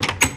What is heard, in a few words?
Door Open, Lock Chime; Minecraft